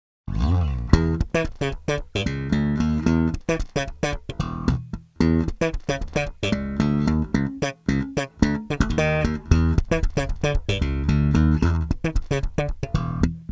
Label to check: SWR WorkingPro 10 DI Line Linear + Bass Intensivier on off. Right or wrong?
Right